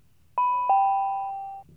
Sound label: chime